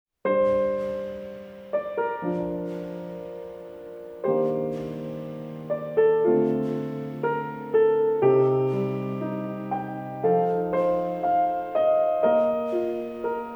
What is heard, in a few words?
piano solo; God Eater 5th Anniversary Best Selection